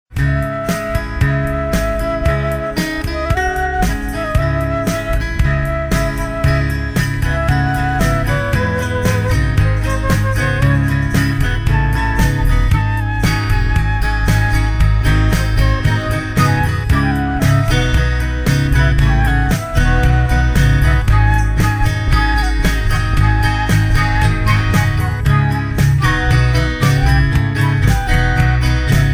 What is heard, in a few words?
flute lead